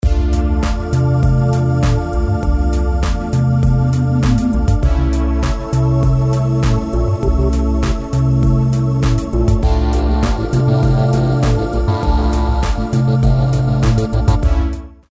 documental musica

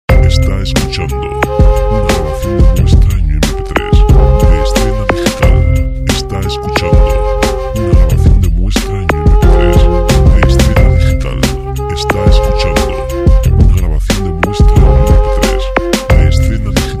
medio flauta; Música para audiovisuales

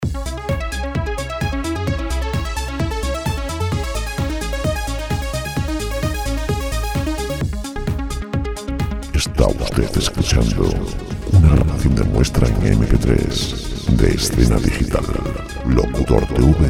dance musica sin derechos; background

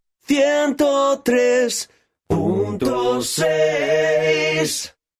cantante singer dial, cantante del dial 103 punto 6

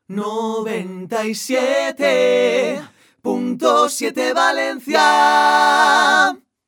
cantante jingle, dial cantado; noventa y siete punto siete; cantantes para anuncios; Cantantes de publicidad

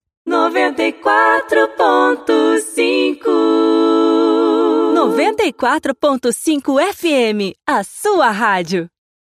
cantante brasil brazilian singer, cantante brasileña; Dial cantando

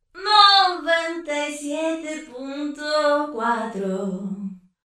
singer locutora neutral spanish cantante, grabación del dial 97 punto cuatro; cantantes para anuncios

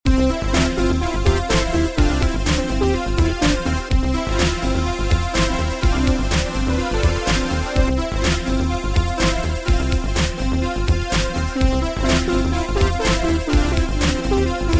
musica muestra pop actual

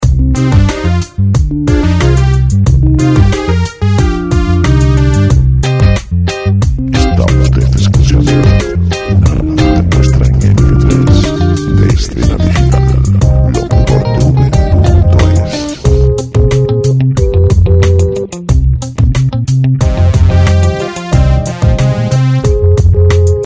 sintonias pop

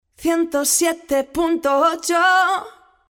cantante spanish singer, cantante dial 107 punto 8; locutora cantante; cantantes para anuncios

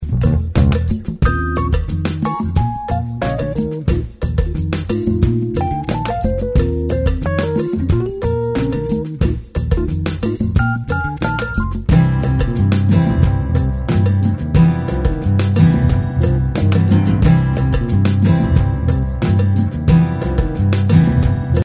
90 lent

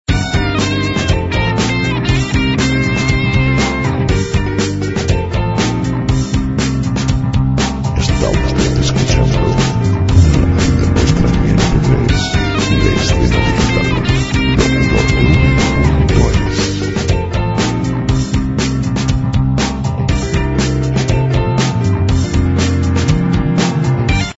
rock

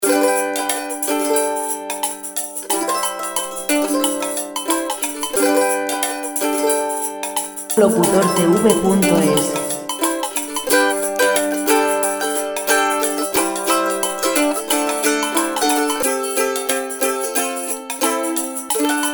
musica libre mandolina 90 folk; Música sin copyright para documentales

